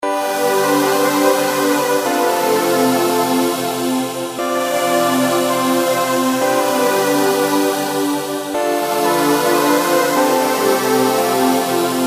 TC Cathedrale

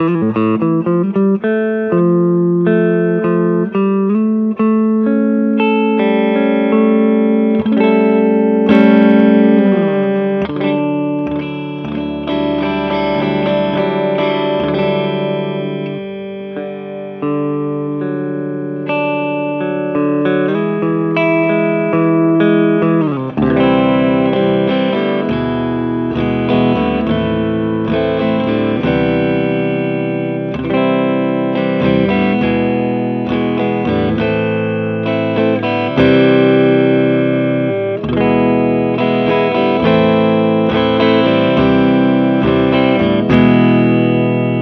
Music Man Mariposa clean both

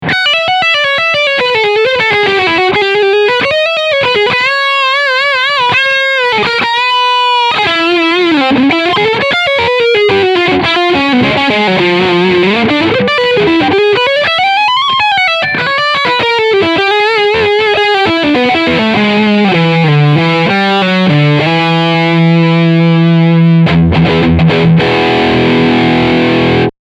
Full Gain

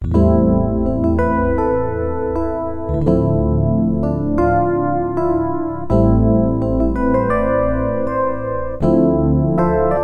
Rhodes

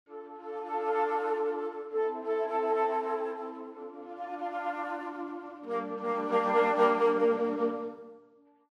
Traditional Flutes; VENTO

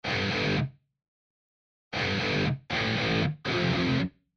Damaged Guitars Straight

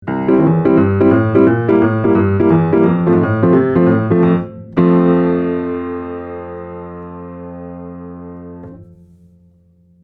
Piano; F8n AdvancedLimit 0dbfs

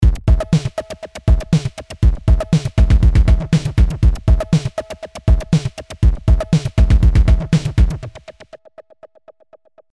CMI V Beats FILTER MS 20 Drums Beefy 16th

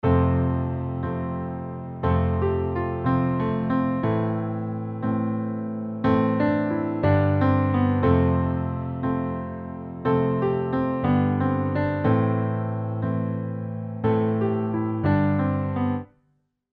EZkeys 2 Acoustic Pop

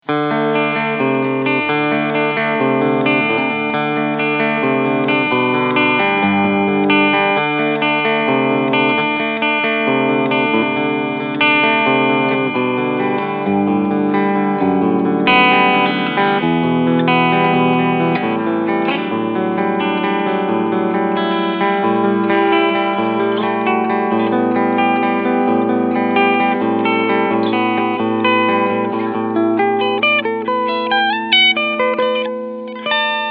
Traveler Guitar EG-1 Custom Cleansound Picking

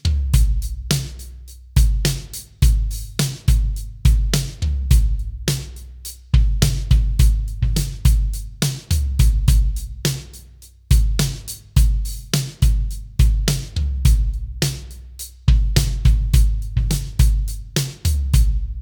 Drums EQed

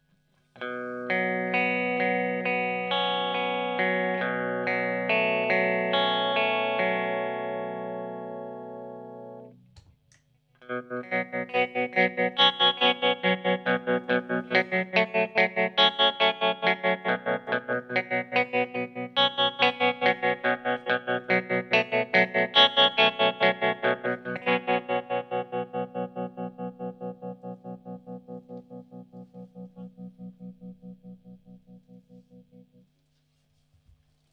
tremolo amp

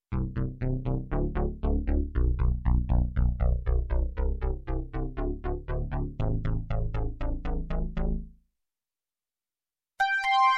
Nord Stage 2 Synth

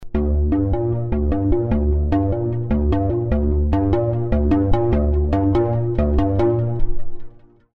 OPX-4 Industrial; Baton

